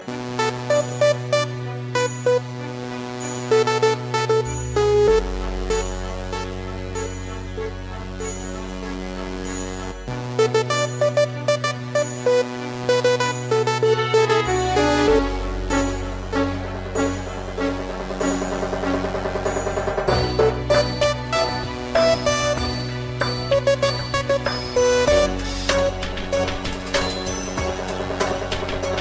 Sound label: arab